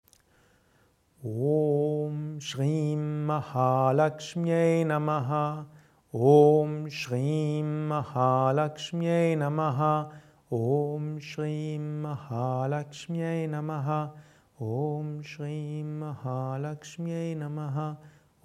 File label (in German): Om Shri Mahalakshmyai Namaha laut leise fluesternd geistig Japa Mantra Rezitation